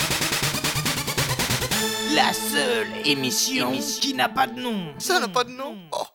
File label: transition jingle; jinglesque d'une durée hallucinante de 5 secondes